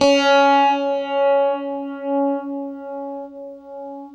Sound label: UNIVIB C#3, Guitar; East West; Steve Stevens Guitar Samples Collection